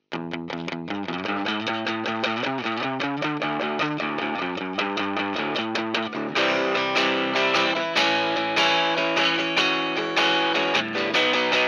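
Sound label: Buzz Saw, Line 6 M13 guitar processor; кажется как-будто струны бьются об лады, верхастый, низа подрезаны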